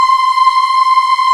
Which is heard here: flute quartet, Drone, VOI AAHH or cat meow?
VOI AAHH